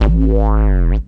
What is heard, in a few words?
Bass - 5A, Jungle; Blu Mar Ten; Jungle - 1989 to 1999